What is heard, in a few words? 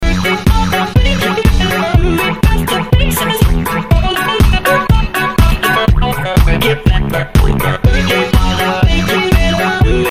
launchpad; tecnohard